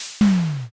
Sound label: hydraulic